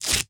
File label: tape